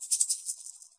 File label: rattle, ethnic and world percussion; Rattles